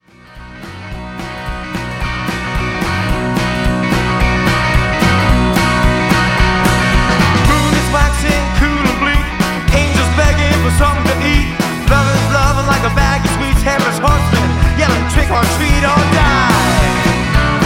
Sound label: New World Blues